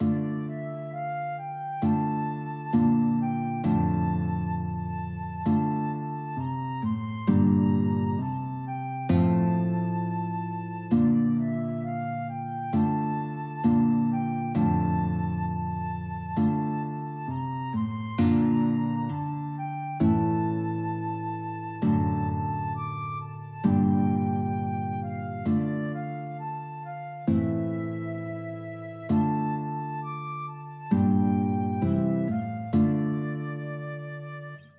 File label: guitar flute, duet